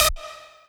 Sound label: lead